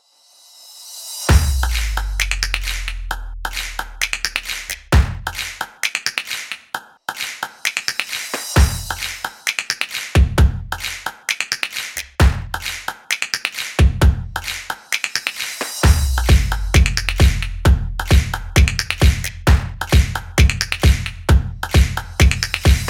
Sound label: Upbeat Snaps